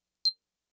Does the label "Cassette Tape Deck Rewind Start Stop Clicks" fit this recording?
No